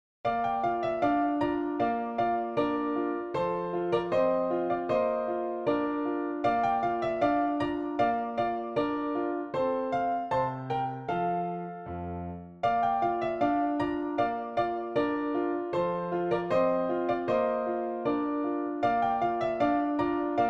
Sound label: Dance PianoTeq Bechstein